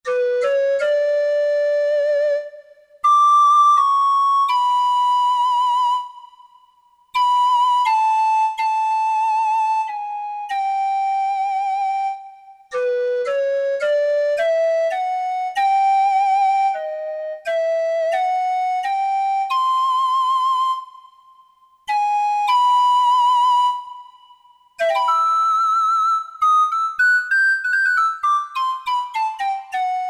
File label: RECORDER